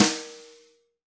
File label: brush snare